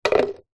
foley plastic tub small down on hard ground; zapsplat

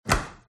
close door freezer; fox audio

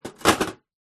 foley metal box small empty set down concrete

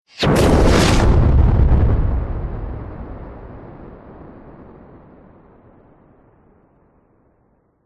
explosions large explosion

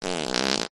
human fart close up; zapsplat

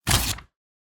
Book Rip Half

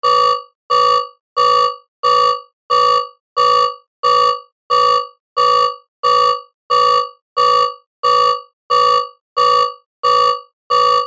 science fiction console alarm; sound effects one

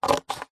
office scissors single cut card tube

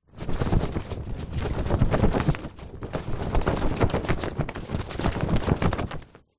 foley flag flap short; zapsplat

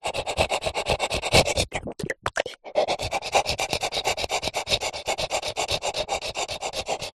dog large pant lick lips; animals; zapsplat

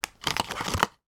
household pregnancy test put back in card box

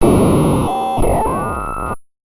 Radio Relay; martyn stonehouse

